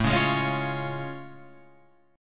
A Augmented Major 7th A Guitar Open F; strummed

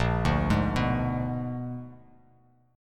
A sharp 7th Flat 9th A sharp down; A#7b9 arpeggiated; JGuitar

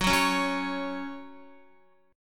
Gb 5th Gb down Guitar; Gb5 strummed; JGuitar

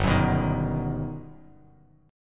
G Augmented Major 7th, Bass Standard; G+M7 strummed; JGuitar

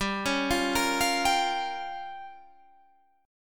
C sharp 6th Flat 5th G

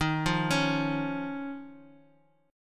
Eb 7th Suspended 2nd; x,18,15,18; Eb7sus2 arpeggiated; JGuitar

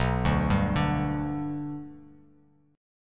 B Minor Sharp 5th B Bass Standard, chord; Bm#5 arpeggiated; 7,5,5,7; JGuitar

